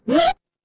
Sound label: retro game jump